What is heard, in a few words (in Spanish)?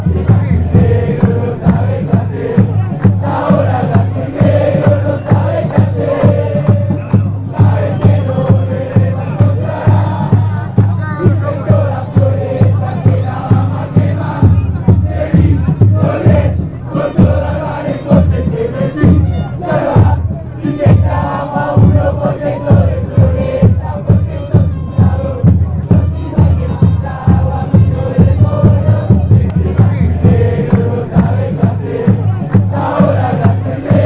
chant, argentina fanchants; atlanta